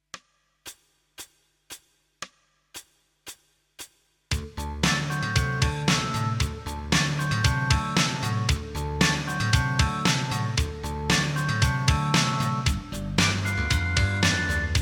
recorder The Blues performance

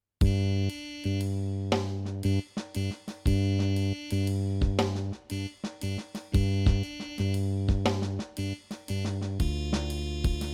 recorder practice; merry xmas

